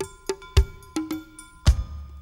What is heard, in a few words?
conga original, オリジナルのコンガ音